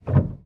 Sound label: piano release